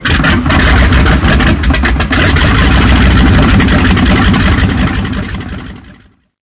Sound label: yak50 start, engines; War Thunder